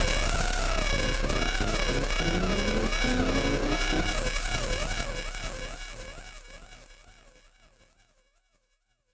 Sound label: Battered Synth; malfunctioning synth samples